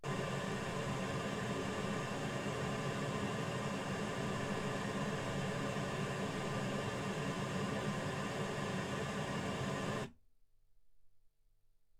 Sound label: pink noise 48kHz